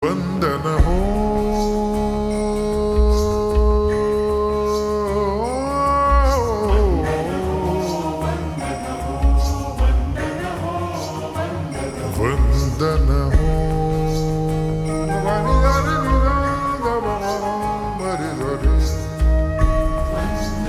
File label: Ringtone Slow Reverb; sangeet manapmaan